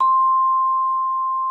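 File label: vibraphone C6; Xsample VOL-4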